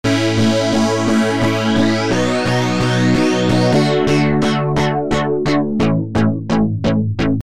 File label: Keys; PatchFactory